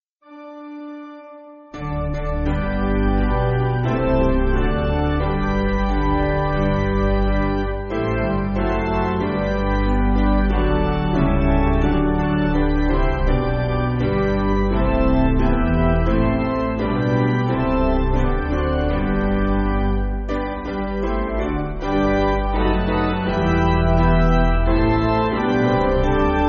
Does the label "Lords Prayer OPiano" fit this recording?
Yes